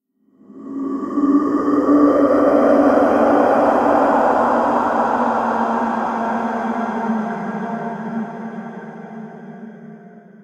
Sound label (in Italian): suoni spaventosi e urla horror sound scary; viral video scary sound